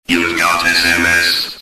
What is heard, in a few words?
you got sms